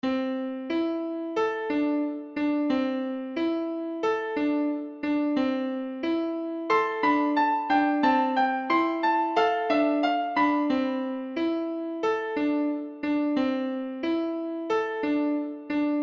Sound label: piano